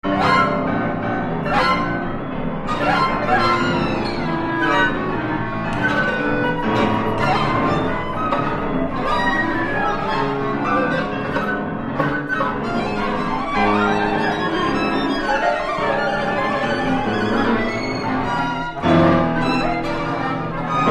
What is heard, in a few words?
cantus